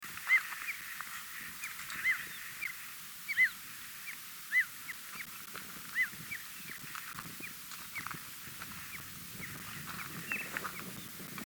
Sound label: Desert Lark; Oshayqer KSA; AbdulRahman Al-Sirhan